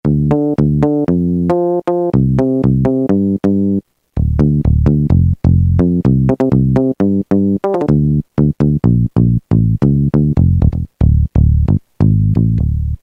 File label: Clix Bass; Roland M-DC1